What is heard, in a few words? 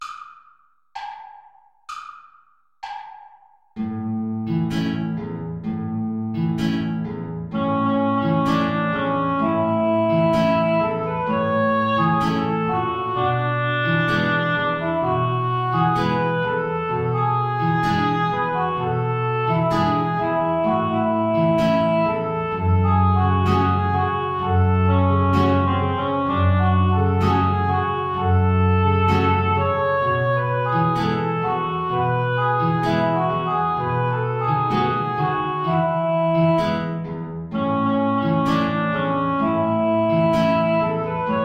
tango play along without oboe; albeniz isaac